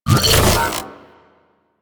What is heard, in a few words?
Shockwave Leap; Warframe; Venus; Venus Terra Shockwave Moa; Abilities